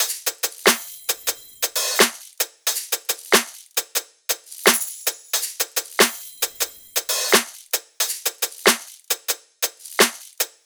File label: top loop, Drums, Loops, Top Loops, Pop; oakdale 90; Slate; SD PASADENA Pop Sample Pack